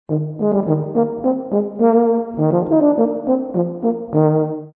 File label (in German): tenorhorn